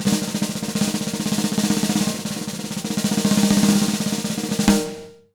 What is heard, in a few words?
100 B BEAT, Jazz Latin Drumloops; USB Soundscan vol.08; AKAI